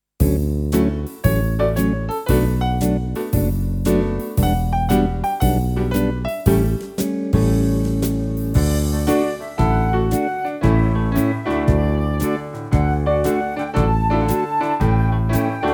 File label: instrumental